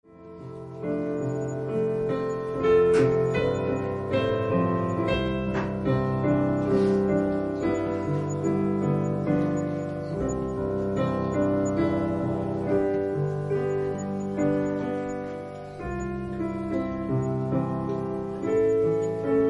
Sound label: Piano prelude; Moses matures